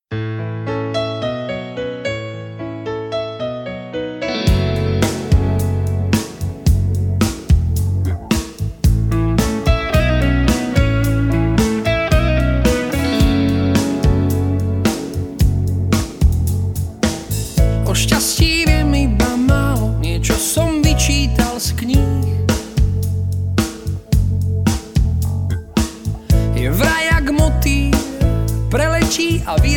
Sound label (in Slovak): instrumental